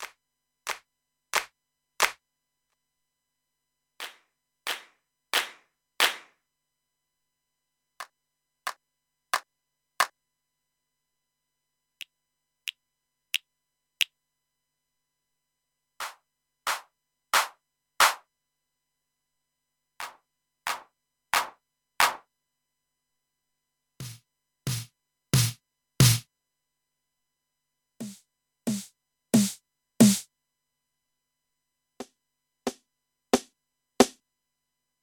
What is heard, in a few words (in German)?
ROLAND SPD ONE ELECTRO Alle Sounds